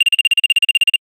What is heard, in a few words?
Clear Ringtone; Fujitsu F-02J